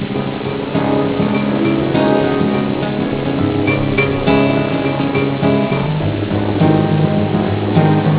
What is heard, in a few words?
musical